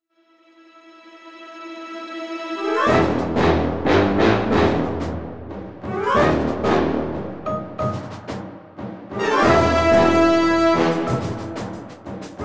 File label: Commercial